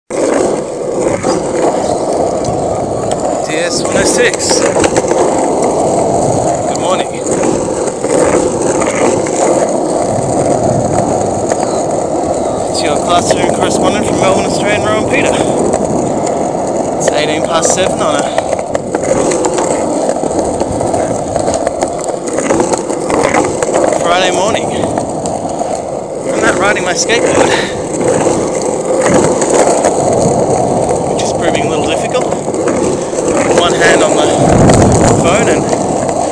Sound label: field recording suburban skateboarding